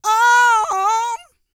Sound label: SING, Vocal Planet R&B & Dance; ILIO